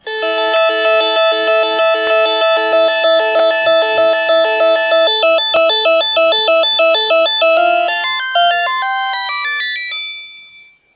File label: tone